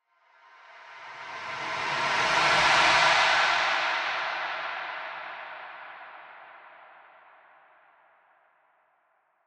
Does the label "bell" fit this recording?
No